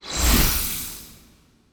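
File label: Summon Pendulum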